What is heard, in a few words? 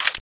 clip in; tfa cso; turbulent 1